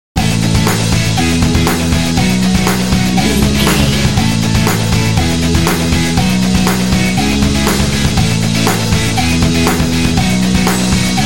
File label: indie funky dance bumper